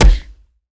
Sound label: kick, Impacts; haptics; com.meta.xr.sdk.haptics; HapticPacks